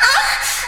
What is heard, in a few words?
vox special